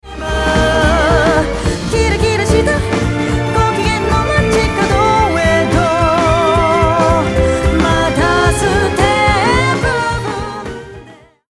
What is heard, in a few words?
Strings